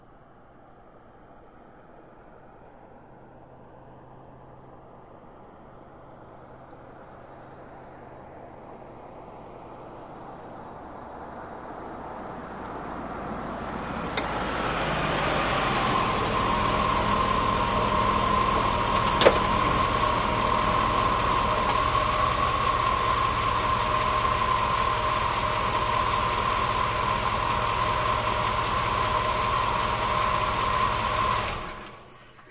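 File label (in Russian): priblijenie avto, approaching car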